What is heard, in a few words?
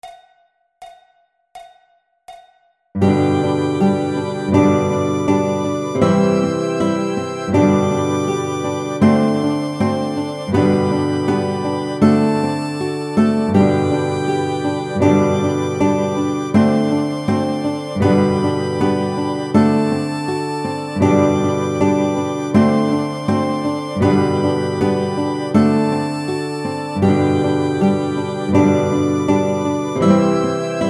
Twinkle Twinkle Little Star Chromatic